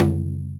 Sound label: Wadaiko; puchicon; dsi ds